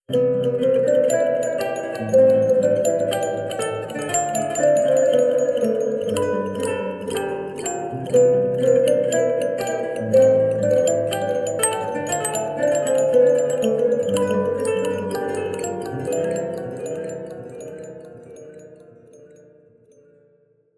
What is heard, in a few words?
Samsung S21 FE Ringtone